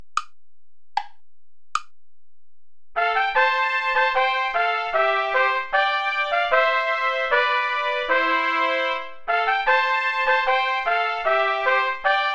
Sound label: bransle gentil play along without trumpet; praetorius michael